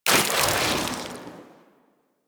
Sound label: Inf Boomerang Melee Throw B; Warframe; Weapons; Infested